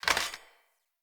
Chop Entrance Axe Pull; Sinecure

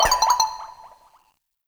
Messenger Message Receive; Warframe; Ambience; Nineteen Ninety Nine

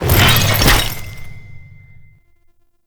UI equip; Veilbreaker; Kahl Customization

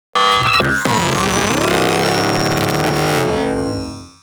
Taunt; Warframe; Corpus; Jackal Redux

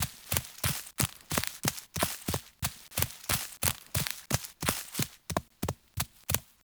Horse Steps Trot Loop Vegetation; Warframe; Duviri Horse; Vehicles

